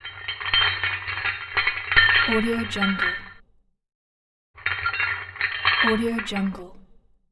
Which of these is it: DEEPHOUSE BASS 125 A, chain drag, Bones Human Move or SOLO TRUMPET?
chain drag